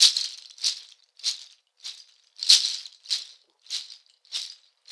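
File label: shaker, Synclavier Percussion, World & Orchestral; ILIO; Synclavier Percussion 2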